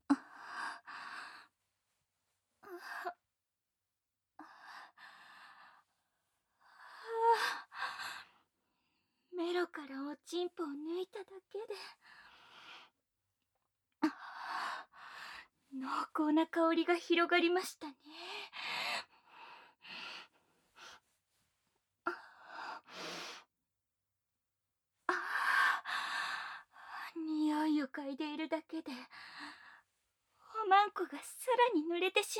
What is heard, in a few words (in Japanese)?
フェラ 耳舐め 低音喘ぎ ディープキス; 低音ダウナー処女聖女とおほおほセックス; 中出し; KU100